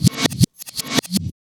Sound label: Tinkle Cut, drum loop, jungle, 161.5; Zero-G Jungle Warfare 1, 2 & 3